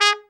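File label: BRA TRUMP, trumpet, brass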